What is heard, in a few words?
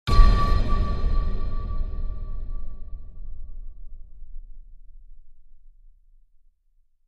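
Impact Metallic Clang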